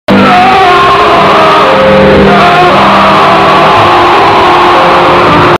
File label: screams; fnaf vhs; meme